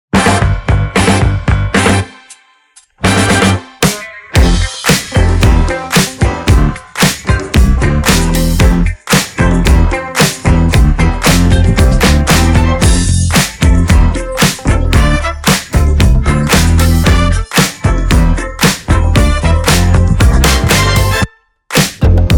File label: INST 114BPM C